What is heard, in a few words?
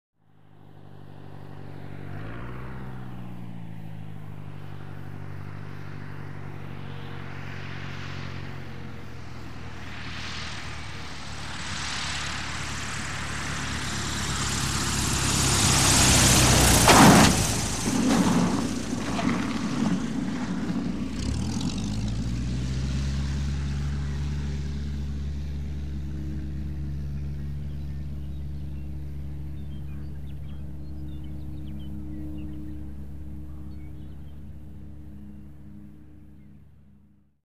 Cessna Prop Plan Land